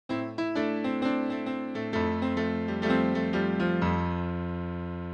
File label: jingle